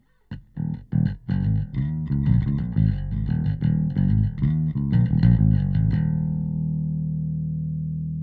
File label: bass; Morph EQ